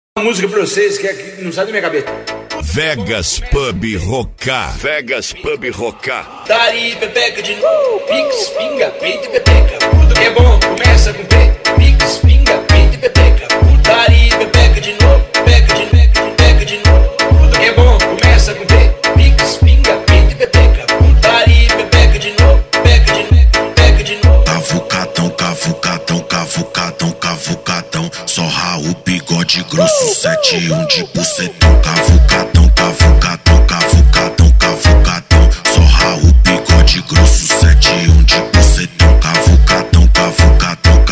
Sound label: funk mega bass